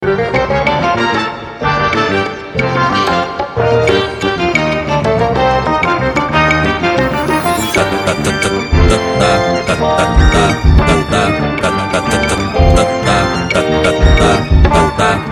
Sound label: fank irani funk